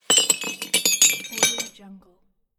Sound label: metal chain set down